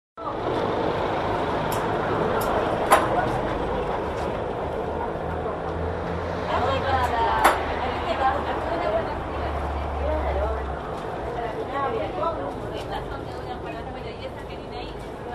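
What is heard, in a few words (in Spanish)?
religion celebracion dia de todos los santos cementerio; la piedad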